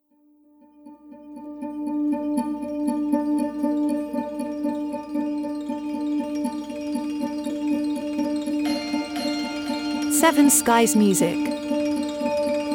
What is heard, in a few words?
Ambient Reflextive Clockshop closing hours